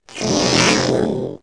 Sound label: zerg drone; 星际争霸音效; 虫族